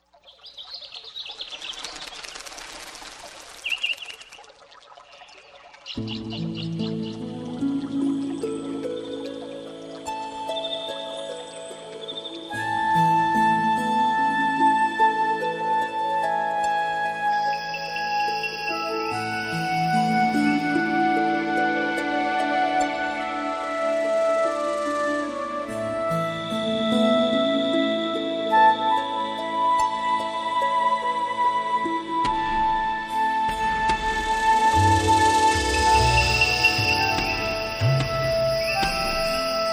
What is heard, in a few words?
MUSIC